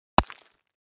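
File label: Brick Hit Sledge Hammer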